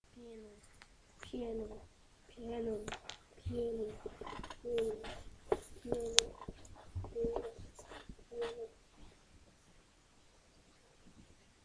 piano

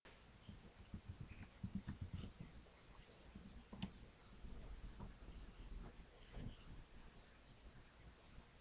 growl

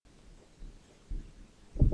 owl

